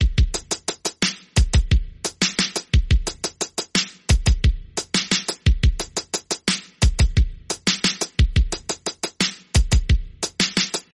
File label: royal hip hop ringtone